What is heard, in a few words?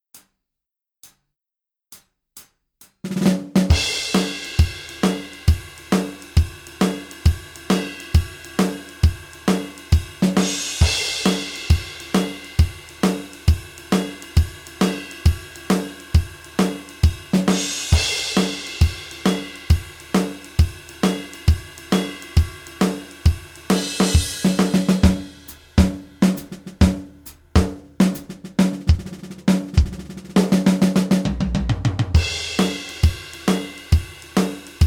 drum